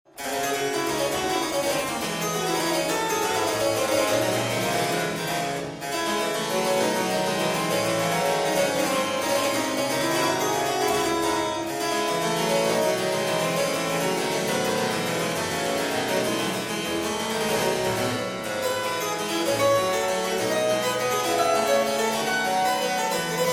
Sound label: courante; Taskin; Duphly